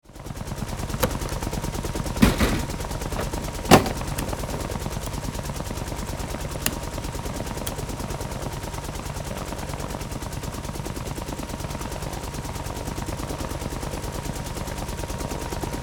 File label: Helicopter inside perspective starting sequence